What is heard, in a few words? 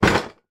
game box put down on carpeted floor; pop up pirate